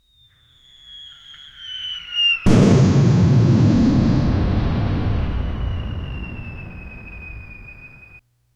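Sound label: nebelwerfer rocket, explosion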